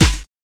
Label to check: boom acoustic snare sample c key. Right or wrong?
Right